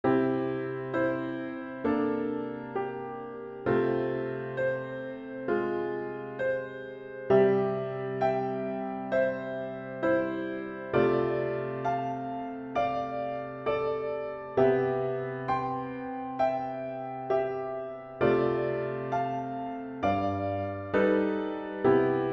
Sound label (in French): alto